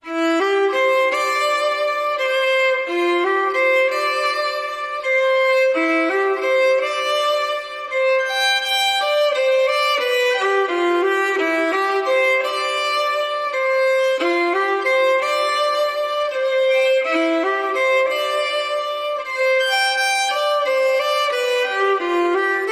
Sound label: Violin